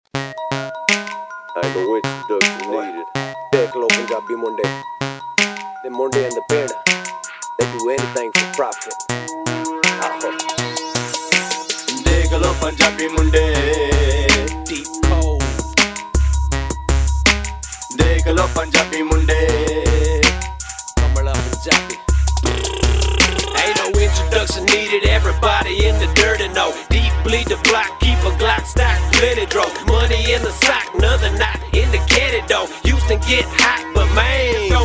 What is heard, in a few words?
PUNJABI MUNDAY, KAMLA PUNJABI